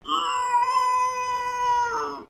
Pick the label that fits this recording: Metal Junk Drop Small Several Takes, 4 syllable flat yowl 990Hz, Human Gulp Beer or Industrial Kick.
4 syllable flat yowl 990Hz